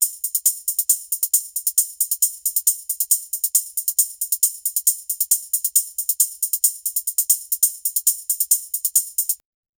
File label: tambourine shuffle loop, 136 bpm